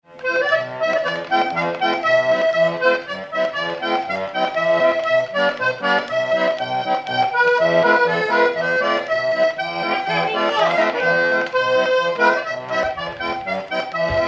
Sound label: accordeon; hilhas n aimis pas tan los omis